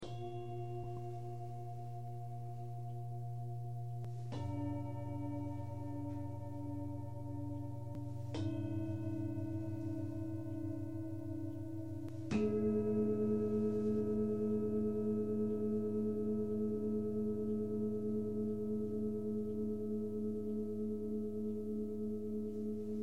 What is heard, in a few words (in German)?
klangschalen